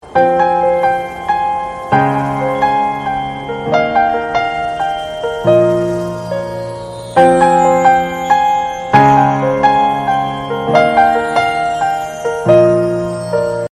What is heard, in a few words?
iphone 7 ringtone; frozen